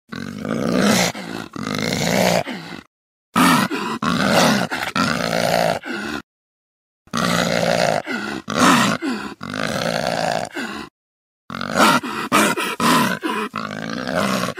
tushkan

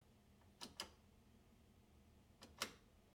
vyt neff vkl vykl svet

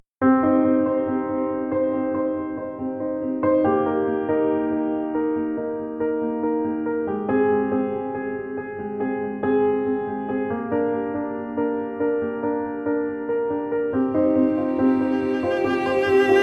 tragicheskaya i grustnaya muzyka

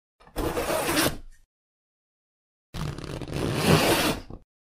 molniya sumki

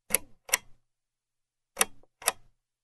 vkl i vykl lamp

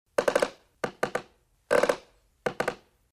kachalka kreslo derev

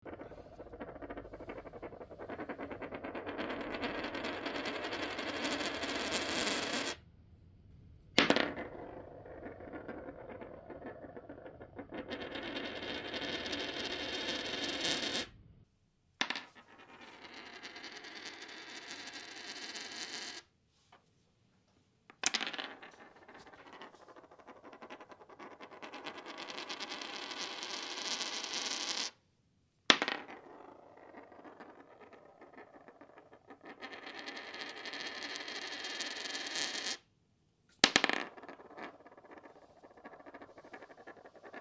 moneta krutitsia

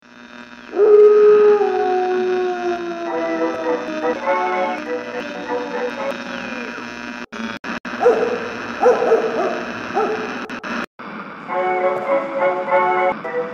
cartoon dog pesnia

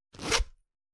zvuk shyrinki

